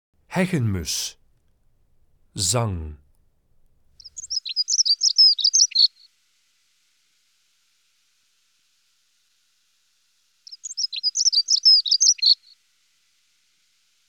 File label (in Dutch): Heggenmus, Zangvogels; IVN